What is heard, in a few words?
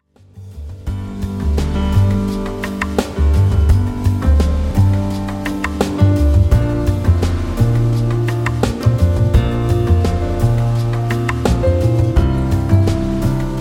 Piano Lounge